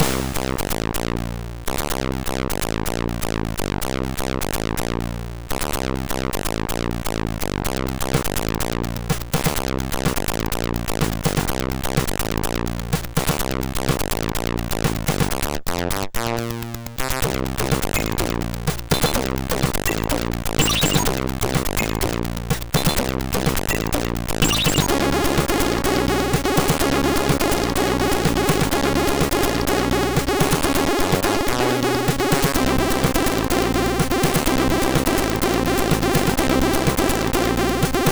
sid MOS6581R3; Yaemon; Twin-Down

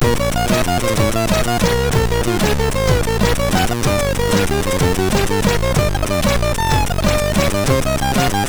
sid MOS6581R3

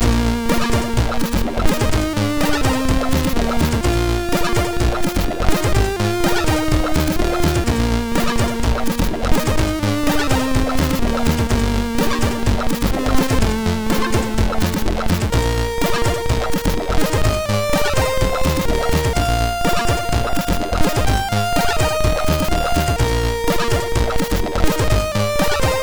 sid MOS6581R4

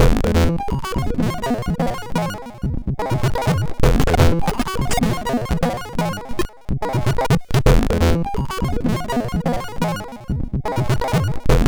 sid MOS6581R4

